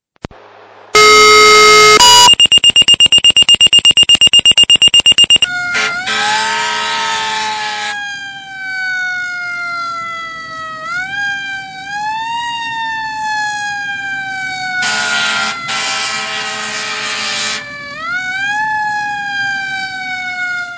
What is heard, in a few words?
Fire Page; Central